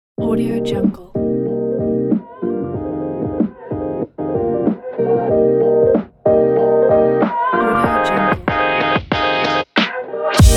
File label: hip hop